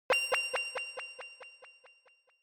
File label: Inspiration Low Delay; ひらめき; 低 ディレイ